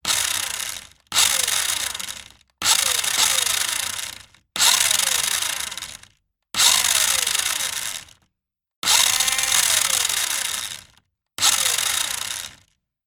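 toy remote control car motor wheel spin bursts various short; zapsplat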